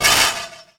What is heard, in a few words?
SPACE CLAP; E-MU Formula 4000 Series Vol. 3; Analog Odyssey